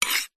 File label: food cereal bowl single spoon scoop